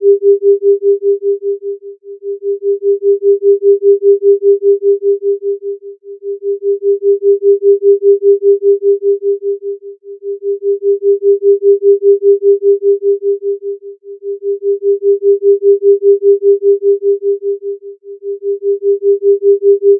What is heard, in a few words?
binaural Beats